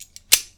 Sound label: lever